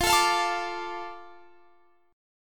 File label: D Minor F, guitar